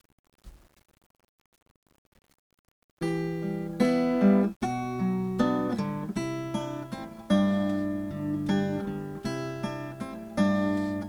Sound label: Wedding Song intro